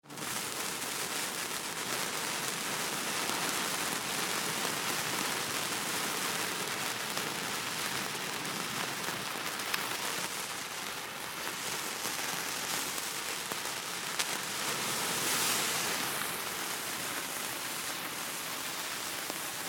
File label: Fire Sparklers Multiple Burn Gentle; Chinese New Year; Sound Effects:Seasonal; Big Room Sound